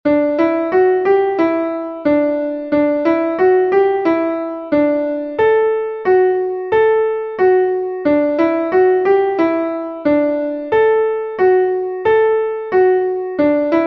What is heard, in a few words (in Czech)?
Neseme májíček melodie zvuk